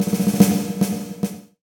Marching Snare

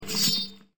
sword

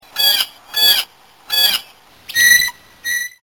bluejay

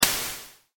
BRUSH ROLL

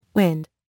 WIND

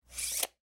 tape measure

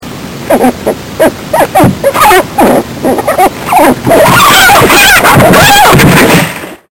groundhog fighting

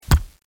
chop single

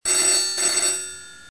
PHONE

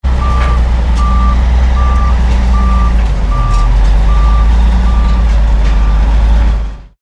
truck reverse

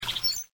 bird

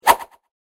Swish

